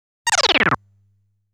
Sound of Enemy Shrinking

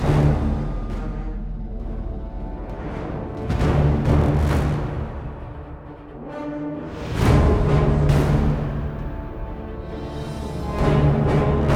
Suspense

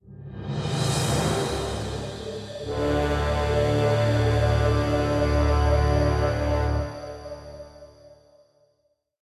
End Stinger; Mass Effect 2; Garrus

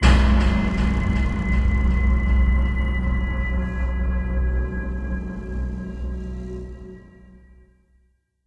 Mission Failed

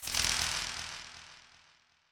Death Beam

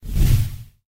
Fast Whoosh

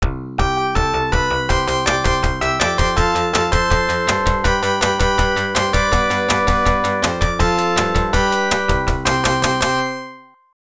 Winning Ceremony; Dunk Shot; System 16B; arcade

